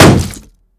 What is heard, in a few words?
shield light block